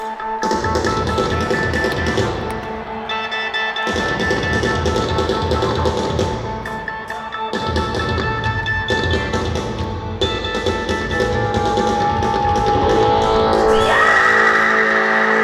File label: Drum Solo; Value City; Columbus, OH; October 9, 2022